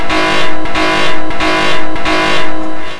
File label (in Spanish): alarma loop; cs16; ze zero; ambiente